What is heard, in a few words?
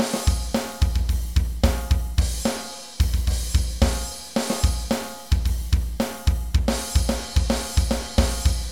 drum; Metal Zone